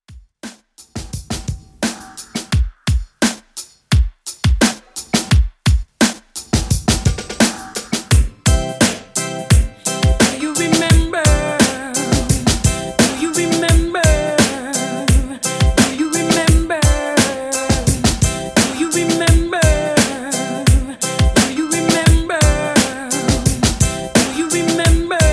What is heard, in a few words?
90s Reggae 86Bpm